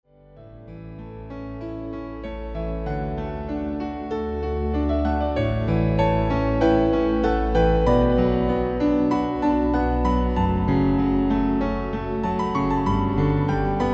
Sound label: Romantic Piano